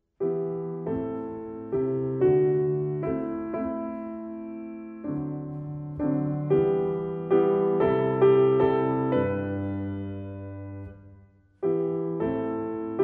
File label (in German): piano solo volk, piano volkslieder; Scheiden